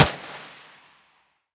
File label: muzzle mono distant